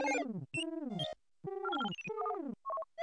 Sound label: Sound beep; Play with the Teletubbies; PS1 Windows